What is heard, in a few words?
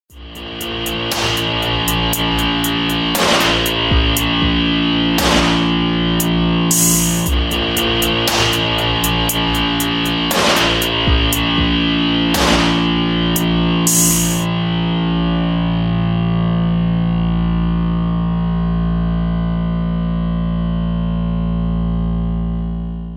guitar sound ringtone